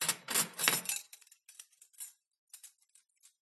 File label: glitched tones household dining room tile table cutlery pick up clatter